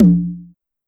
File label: Conga Lo Down Fast, Pitch Env; Clavia DDrum-AT; Pitch Env Various